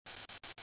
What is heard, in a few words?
fuelpump